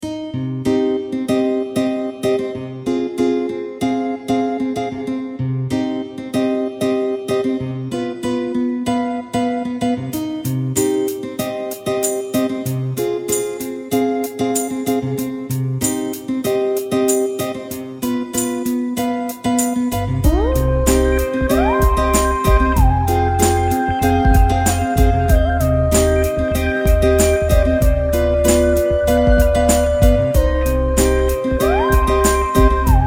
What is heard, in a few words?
freestyle instrumental